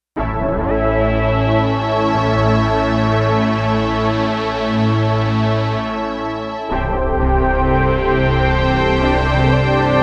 OBX Filter; Digital Synthesizer

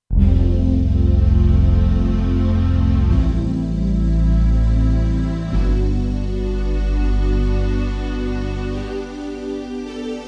Digi Ensemble, Kurzweil K2500X

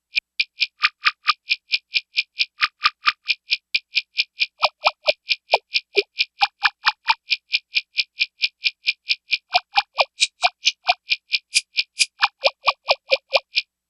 TAP TAP

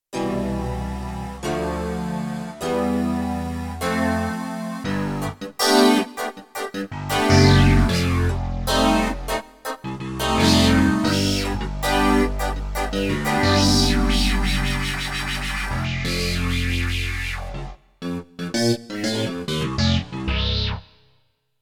Roland D-70 Band Rhodes; Factory Performances

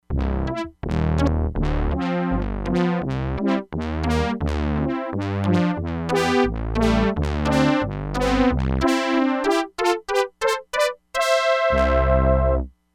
CHOP BRASS, Crumar Bit 99; Factory Programs

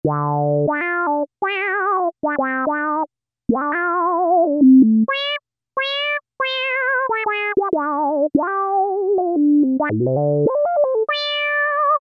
Rez Lead, Korg MS2000; Factory Patches

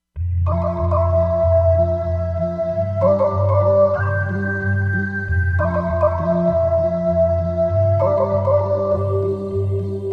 Perc Voice, Korg MSC-07S Synth

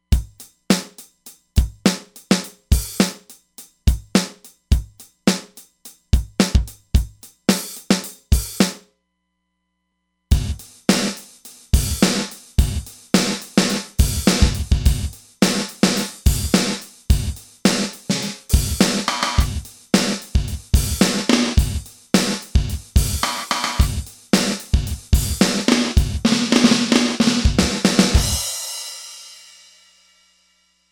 Hard Gate

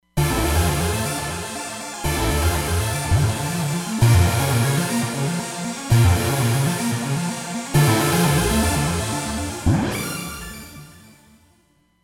Step Trance, Roland Fantom XR

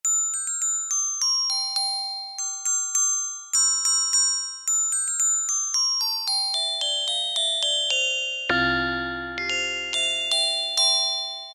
Glockenspiel, Roland SC-50 Sound Canvas; Factory sounds

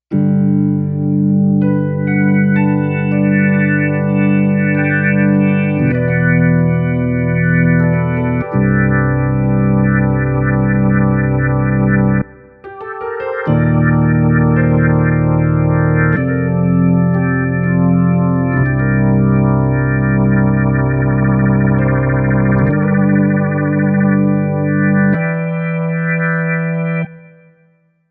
Organ Piano

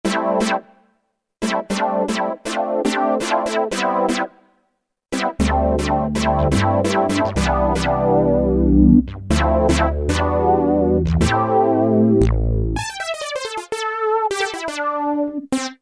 MATRIX 12 HOUSE

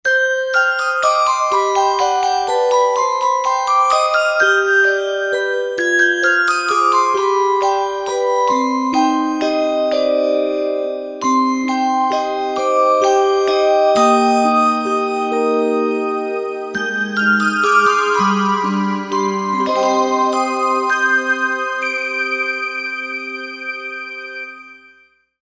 Ballerina Bells, synth

